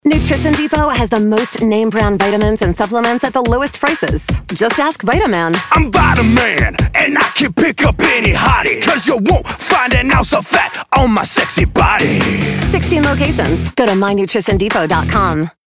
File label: Jingle